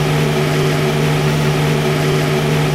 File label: EX IDLE, F1; AOS F1 2022; FSR2018